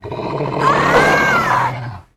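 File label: Nord attack female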